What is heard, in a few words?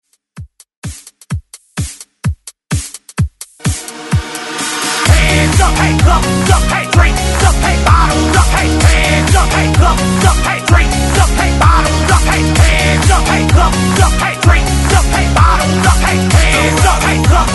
Hype Redrum 128 Bpm; DJDOLLS